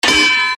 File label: bell clang